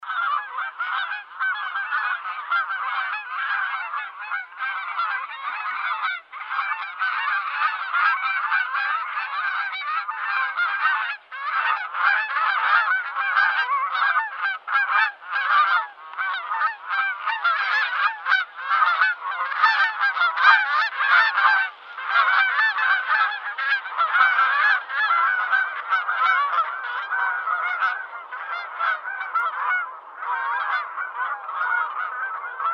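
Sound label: sound of flying flock of wild geese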